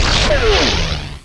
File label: takeoff; Alien